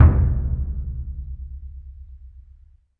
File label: big burundi, African Burundi Drums